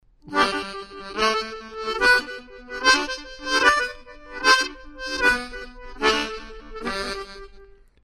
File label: Shimmer Diato, harmonica